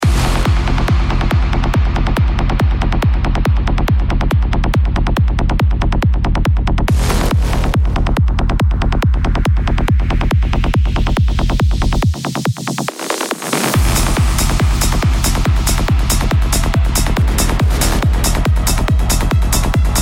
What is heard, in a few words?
industrial drive